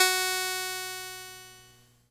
VOX F#3, Vintage Keyboards; Big Fish Audio - First Call Vintage Keyboards

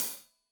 Hat Loose, Hi-Hat, Cymbals Stereo

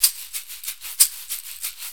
6-8 Caixi, Perc Loops; Drum Tools

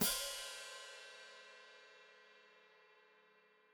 Hat Closed Open, Hi-Hat

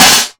METAL, PERCUSSION; Drum Hits

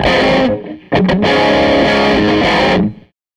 Tele Guitar Loop

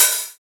HH, Dance Mix

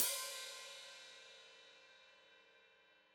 Hat Foot Open, Hi-Hat; Deeply Sampled Drums

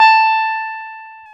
Jazz Guitar

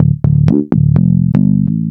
BSE 125 D, BASS LOOPS, House; Best Service ProSamples vol.10 - House; AKAI

